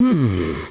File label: humm; BattleSNDS